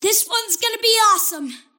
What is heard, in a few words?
cast pain; kidvoker; dota; invoker